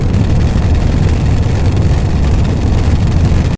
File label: Engine Idle